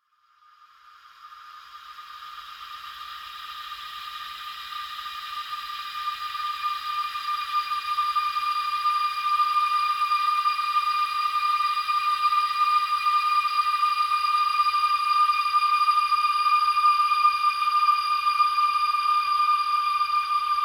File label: Ghost; SyrinScape; Clockwork entities